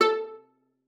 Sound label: A4, VIOLA PIZZ; Xsample VOL-5